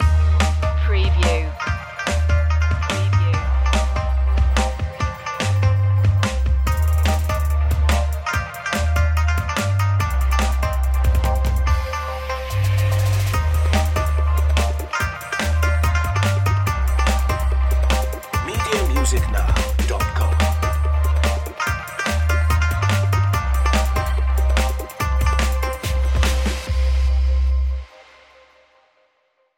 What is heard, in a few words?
india charm